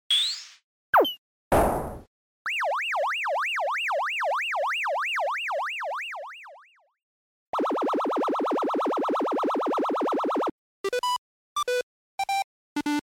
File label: Arcade Shooter